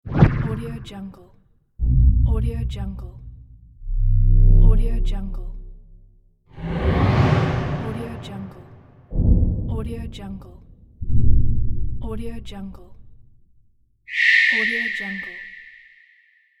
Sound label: wooshes